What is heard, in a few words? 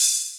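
HH open; drum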